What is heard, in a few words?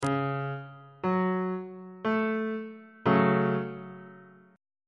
g flat minor 2nd inversion on bass clef